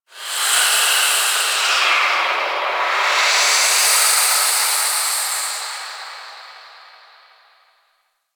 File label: Moon Wind Small, Ambience; Warframe; Orokin